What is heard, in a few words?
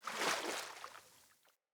Surface Body Roll Water; Characters; Foley; Surface Body Impacts